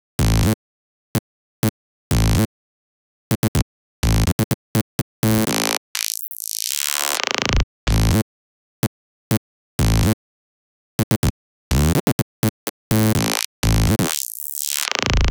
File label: Bass, Afterhours EDM, Electro Shock; Sonic Foundry (Sony Creative Software)